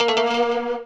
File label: scatter Hit; netent; reelsteal